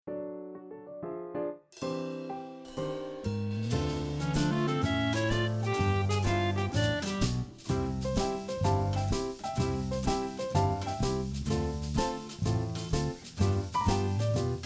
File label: karaoke